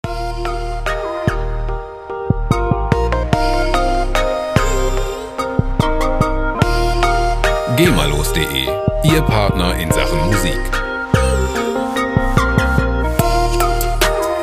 china trap

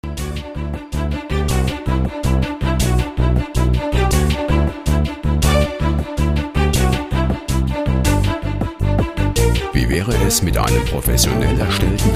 string parade

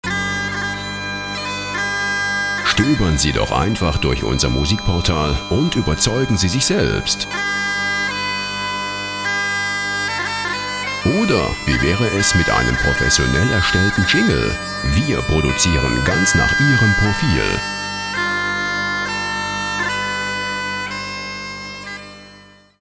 bagpipe

